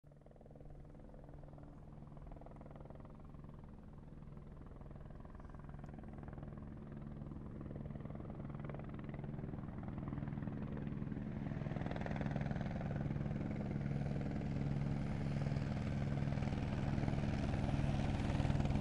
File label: Helicopter approaches, lands and leaves